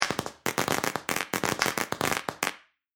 Electric Crackles Welder, Electric arcing from arc welder; Electrical Sound Effects; Electricity sounds; LYN111 package of Electricity sounds